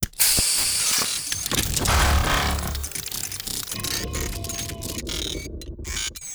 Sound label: technosphere opens; Stellaris; guardians